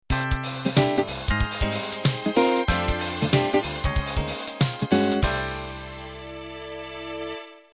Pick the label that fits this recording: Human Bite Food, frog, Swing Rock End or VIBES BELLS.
Swing Rock End